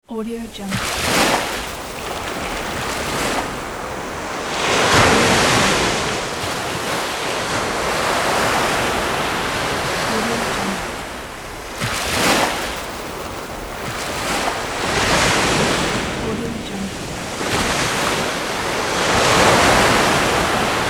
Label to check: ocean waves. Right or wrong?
Right